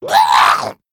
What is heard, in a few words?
Murloc Death; Winterfin